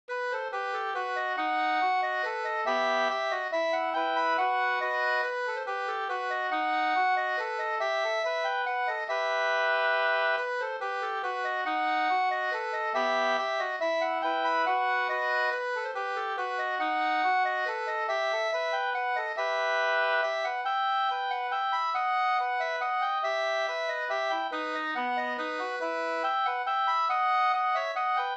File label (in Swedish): vals, E moll